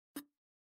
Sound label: delete; oneplus